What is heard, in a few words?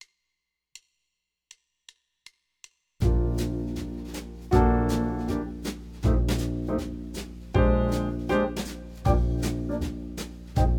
Backing Track